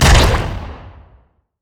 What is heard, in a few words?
elastic slingshot; LoL; zac base